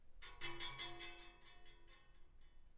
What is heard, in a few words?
Metal Spring Bounce